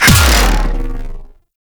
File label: Kinetic Plating Hit; Warframe; Runner